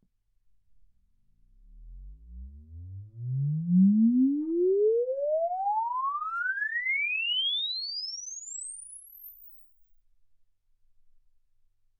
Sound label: exp swept sinus 10Hz 24kHz